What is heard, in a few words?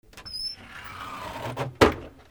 PHONES